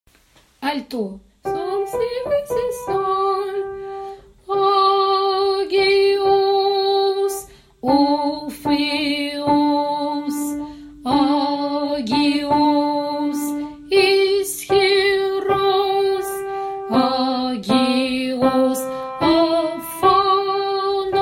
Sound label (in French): Saint Dieu grec Alto